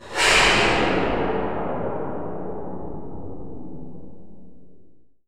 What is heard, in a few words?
AMBIENT HOR; Rarefaction; Poke in The Ear With A Sharp Stick